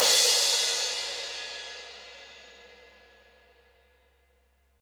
Crash

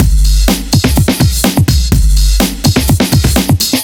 Bigger Beat A

